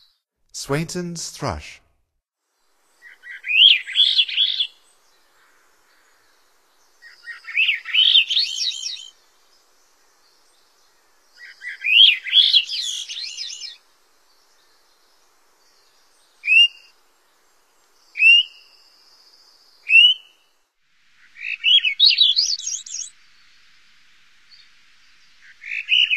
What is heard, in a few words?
Swainsons Thrush; North America; Stokes